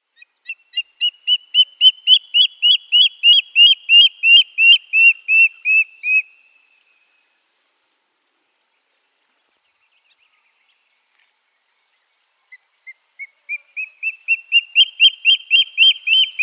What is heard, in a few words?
Senegal Thick-knee display